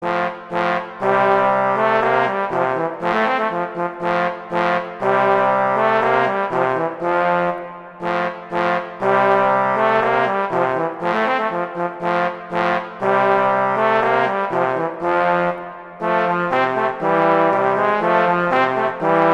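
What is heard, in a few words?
Duet, horn; Mozart; Duets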